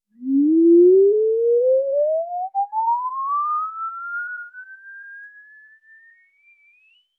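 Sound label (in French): son de logo très court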